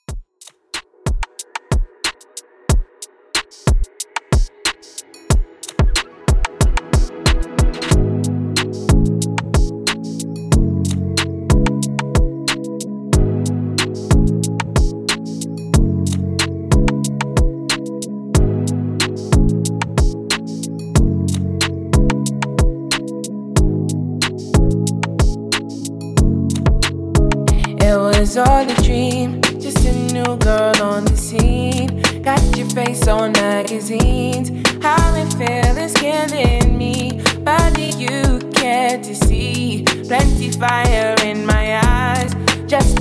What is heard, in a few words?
Extended Mix 92Bpm; DjYan